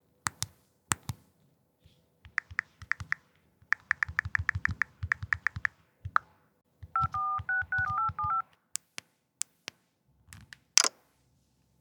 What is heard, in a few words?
Iphone Sounds